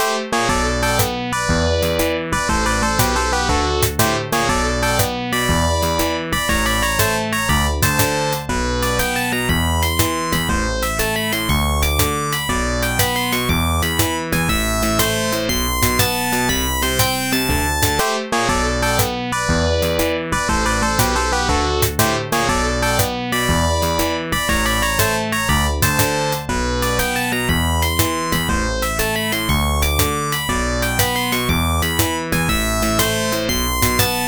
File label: Jazz Song; Ken's Labyrinth